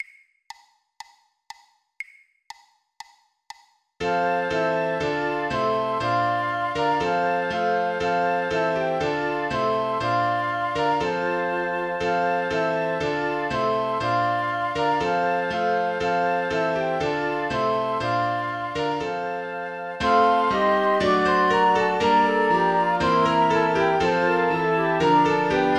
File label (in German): Flöte Klavier; Angels from the real; 120bpm, 1x; weihnachten